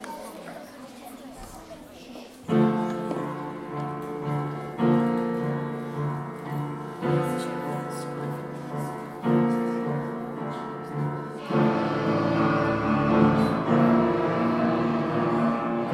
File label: music concert